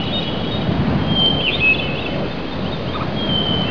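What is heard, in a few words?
ambience inside, temple; cstrike; de templum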